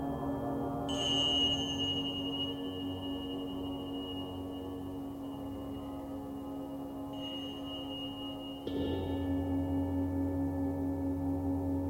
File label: tibetan